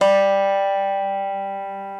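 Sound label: reference pitch dobro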